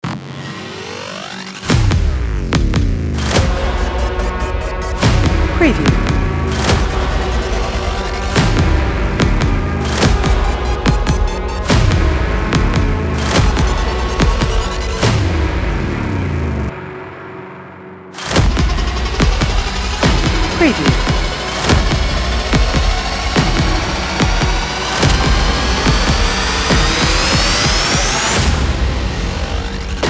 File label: Cinematic Rising Tension Thrilling Countdown Trailer Intro